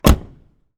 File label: Door Slam; Angry Goy II; SoundEffects